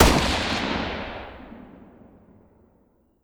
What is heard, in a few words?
fire dist, m16 weapons; Urban-Coalition; Decay; arccw ud